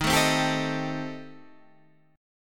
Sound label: D sharp 6th Flat 5th, D sharp, down, Guitar, Standard; strummed; JGuitar